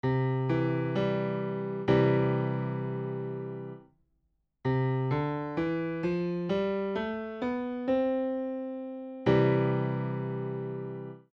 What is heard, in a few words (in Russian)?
ionijskij zvuk; Звучание: светлое, устойчивое, радостное.; Пример: до–ре–ми–фа–соль–ля–си–до.